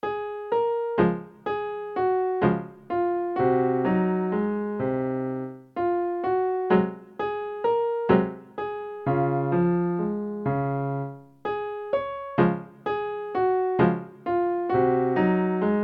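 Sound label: latin serenade in d flat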